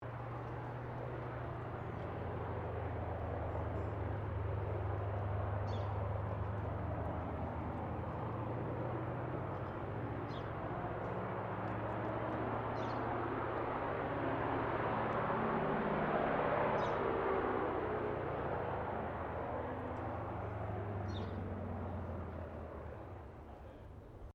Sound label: AIRCRAFT CAC WIRRAWAY PREFLIGHT DISTANT STEREO; CA-16 Wirraway; Airplanes (Sound Effects:Vehicles)